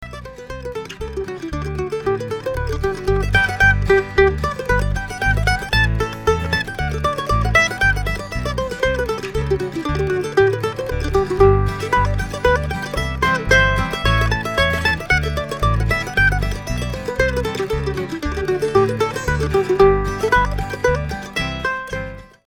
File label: sailors hornpipe